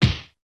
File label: impact